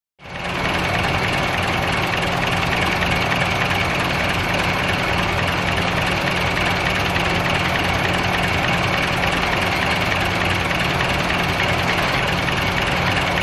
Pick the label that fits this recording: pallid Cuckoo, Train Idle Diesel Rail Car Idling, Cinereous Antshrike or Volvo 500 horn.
Train Idle Diesel Rail Car Idling